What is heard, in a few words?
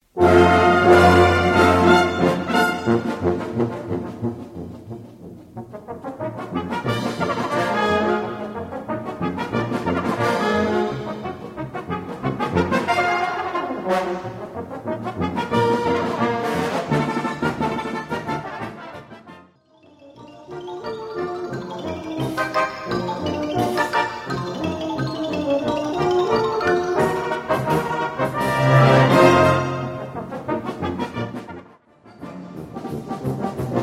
galop